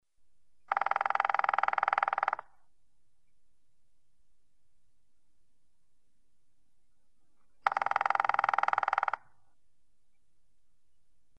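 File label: grey-headed woodpecker voice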